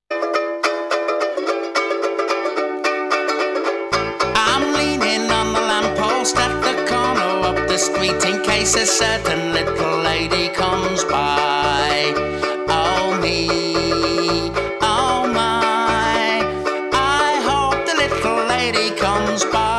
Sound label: bass